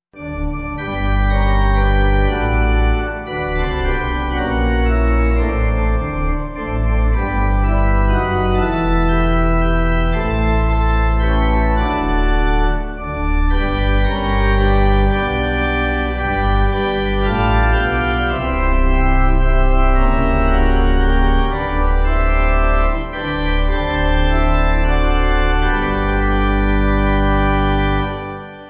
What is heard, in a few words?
Theres A Way Back, Swinstead, pipe